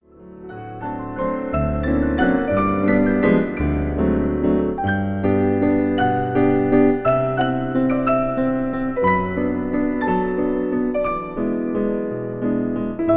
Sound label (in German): Walzer; Lehar